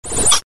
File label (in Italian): suoneria botton; beep